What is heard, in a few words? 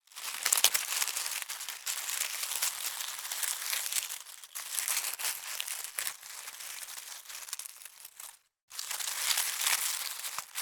animals squirell rummaging dry leaves autumn; zapsplat